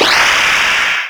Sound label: Scream